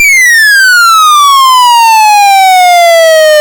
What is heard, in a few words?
Apple II FX Falling Bomb